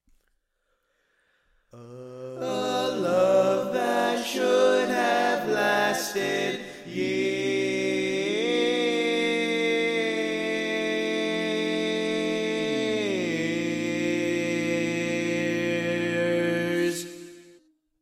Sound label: Bari, barbershop tags